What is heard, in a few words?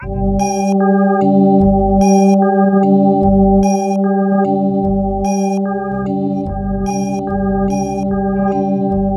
C2, Synth Line; 90 s Sample CDs; NiceBeats; SynthLine VOL-17